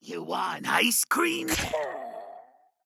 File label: goon approuch; Blind Drive